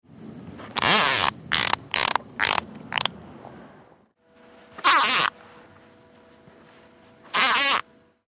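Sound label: Spea multiplicata release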